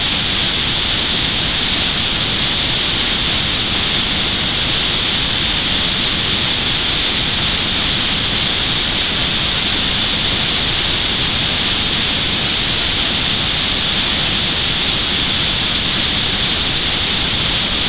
rain inside, music

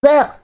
ooff; Gawr Gura

